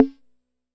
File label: Jomox MBrane drum machine; Jomox MBrane 11